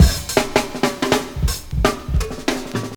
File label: Ruffneck 162, breaks; minihalfs